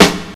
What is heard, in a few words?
Snare, Drum Machines; 300 Drum Machines; E-MU MoPhatt